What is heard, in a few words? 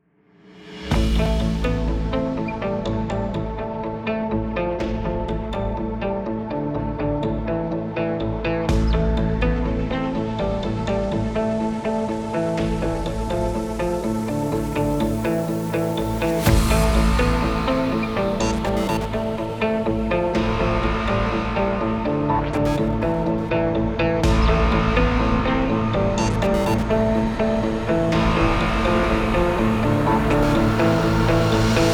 Epic Anxiety Trailer